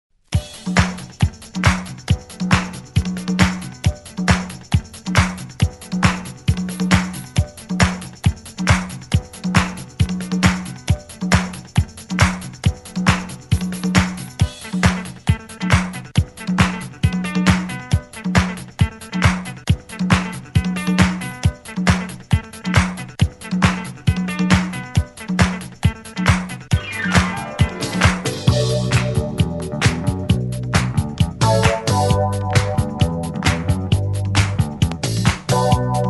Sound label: 70s 136 Bpm; DJDOLLS; 70s Intro Outro